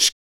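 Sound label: vox percussion, Vocal Planet Jazz FX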